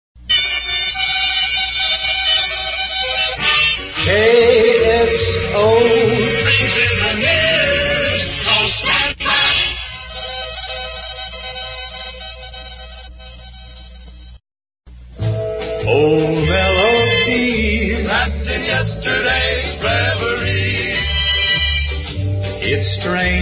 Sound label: cheap quality jingles